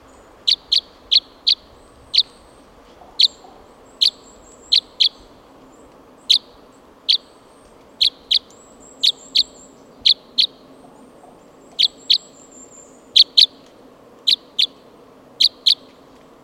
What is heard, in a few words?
Type N04 - FCs, Crossbills